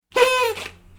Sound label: party trumpet